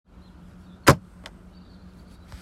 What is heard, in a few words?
open case